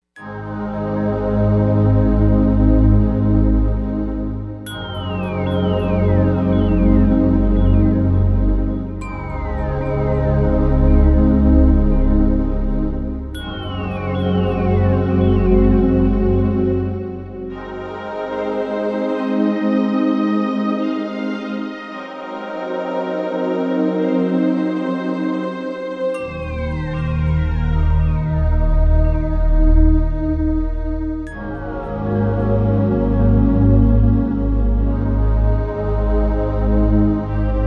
Ethereal Echos

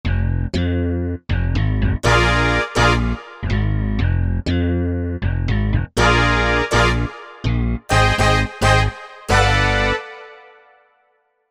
Bass Brass, Korg MSC-1S; Korg MSC-1S Variety

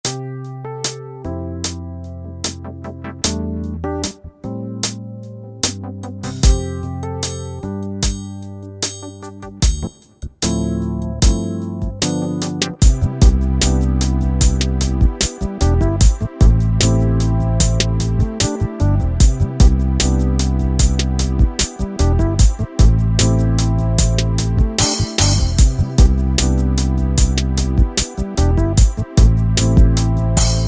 Soulful, Yamaha DJX Styles

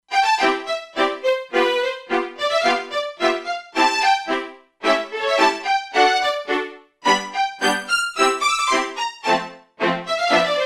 Violin & Celli, E-mu Proteus-2; Factory Presets

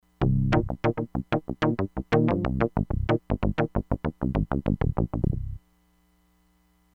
LOWER OCTIVE PERCUSSION, Crumar Bit 99; Factory Programs

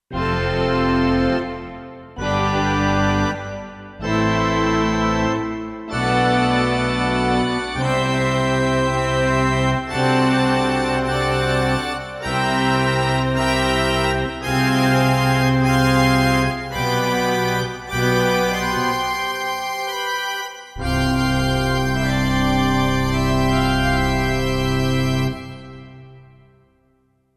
Orch, Kurzweil K2500X; Factory Setups

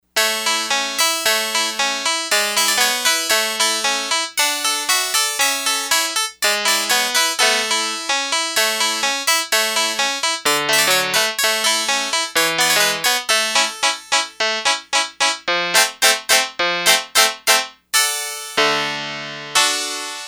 Harpsi Hi, Yamaha DX100; Factory Patches

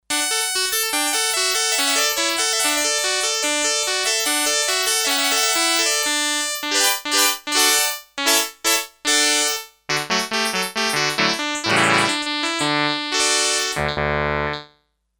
Harpsichord, Sequential Circuits Six-Trak; Factory Programs

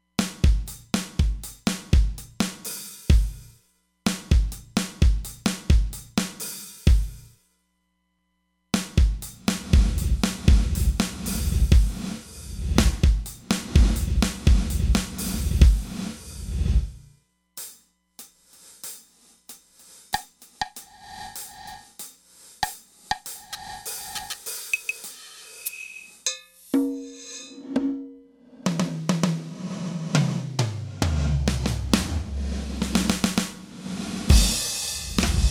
Inverse Room; Roland DEP-5; Factory Patches

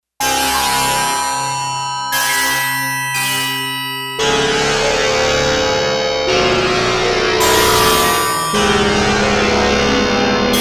FM Stax

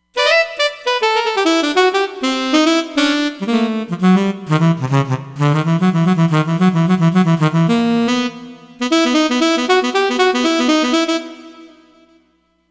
Alto Lead Sax

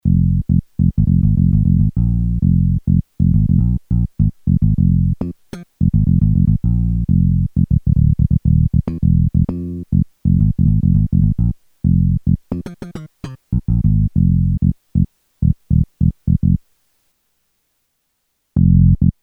Compressor; t.c. electronics M300

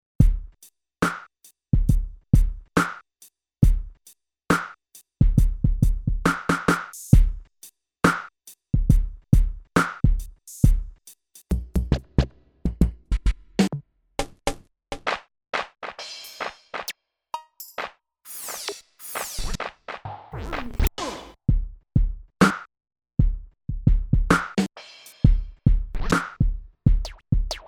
Hip Hop Kit

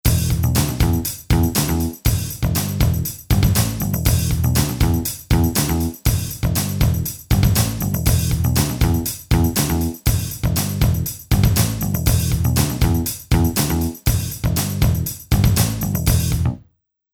Rock

